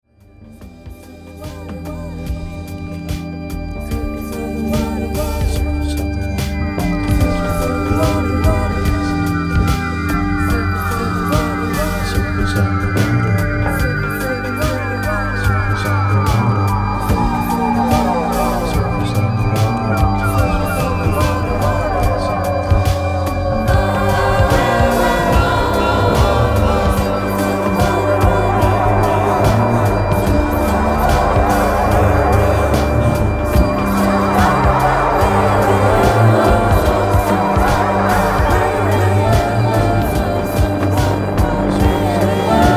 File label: circus underwater